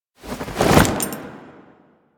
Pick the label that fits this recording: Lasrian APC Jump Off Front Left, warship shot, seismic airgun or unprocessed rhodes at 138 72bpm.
Lasrian APC Jump Off Front Left